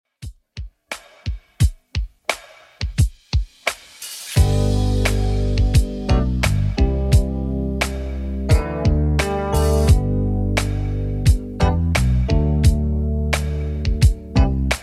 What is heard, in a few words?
70s Redrum, 87 bpm